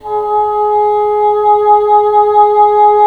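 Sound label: wind bassoon; LA Composer vol. 3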